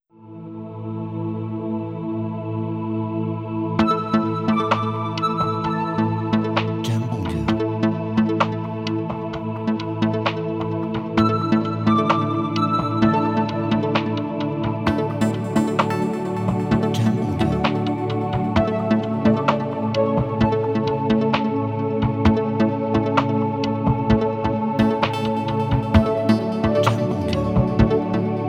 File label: Electronic Ambient